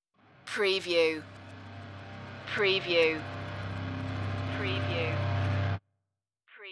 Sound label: industrial eeriness stinger